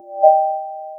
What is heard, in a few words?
calm notification